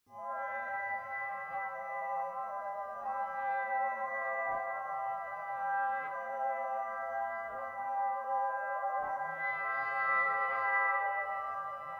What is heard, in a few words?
ambient